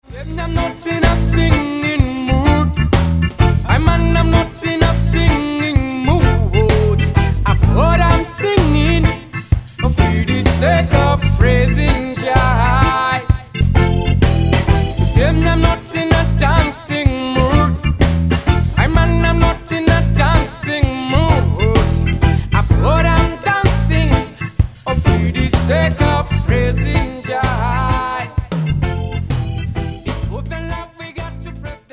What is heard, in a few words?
Singing Mood